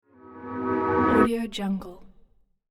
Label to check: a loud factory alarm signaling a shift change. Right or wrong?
Wrong